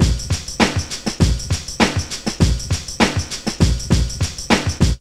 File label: tamb jam 100; Zero-G; Funky Elements